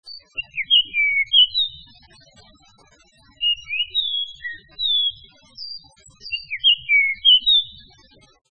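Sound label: Japanese grosbeak; ikaru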